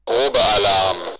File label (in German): Hurricane probealarm; feuer